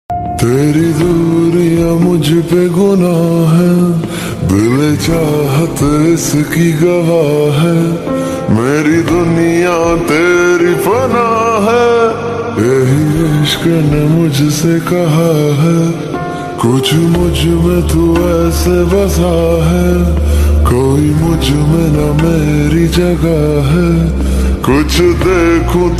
Sad Ringtone

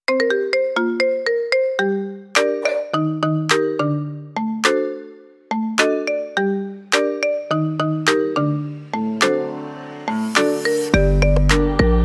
Marimba Remix Ringtone For Apple iPhone

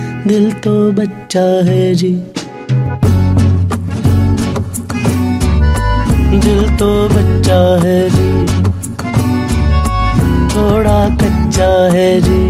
Best Bollywood Song Ringtone

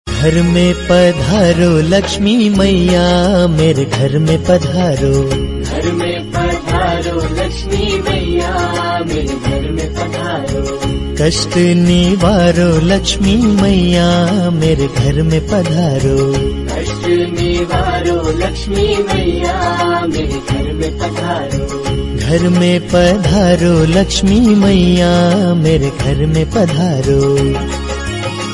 Bhakti Song Ringtone

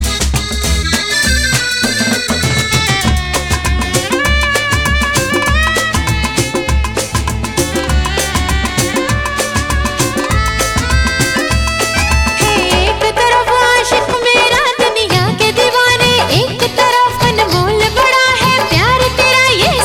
Instrumental Ringtone

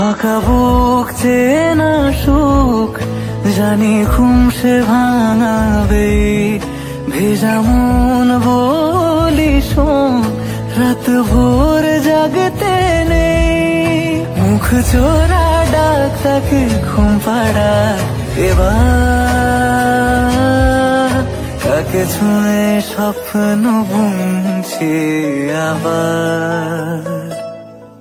Bengali Song Ringtone

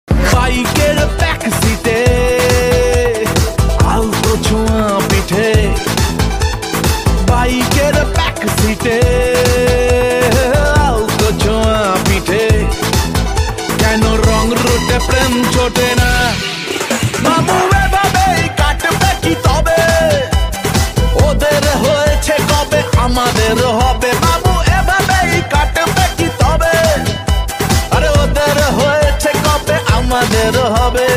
Bengali Ringtone

